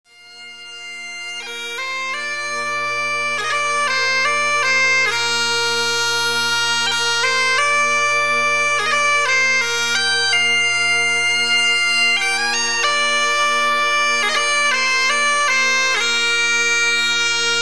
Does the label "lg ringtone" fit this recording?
No